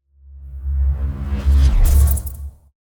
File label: Just Transitions SciFi; soundbits